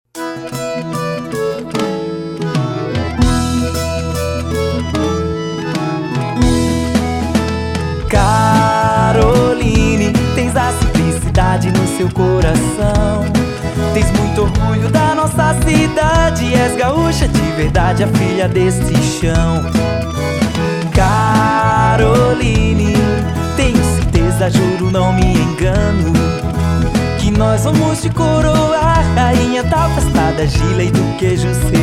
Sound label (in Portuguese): JINGLE